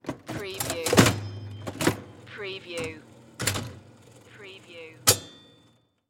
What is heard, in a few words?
Slot Machine Pull; sound effects bible